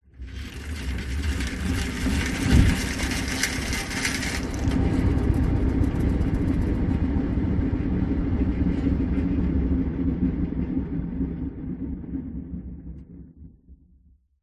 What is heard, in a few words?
vehicles bicycle pushbike wheel pedal fast then stop freewheel; zapsplat